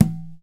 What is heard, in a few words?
HI TUMBA TIP; Conga Battery; E-MU Formula 4000 Series Vol. 4; Earth Tones